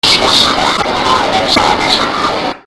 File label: alert; slender